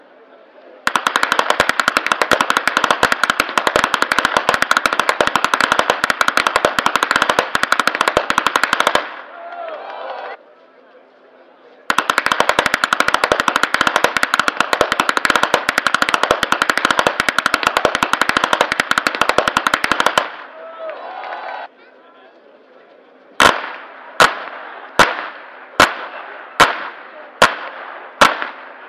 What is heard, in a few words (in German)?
schnalzen, flachgau